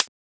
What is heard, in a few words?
Closed Hat; FLPRO